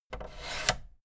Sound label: fader plastic scrape double up medium